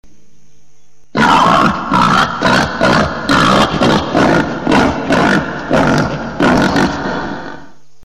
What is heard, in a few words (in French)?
sanglier cri; mammiferes; parcours santé